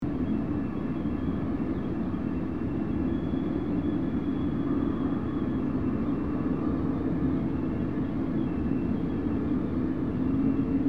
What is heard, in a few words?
Attic room tone ambience wind blowing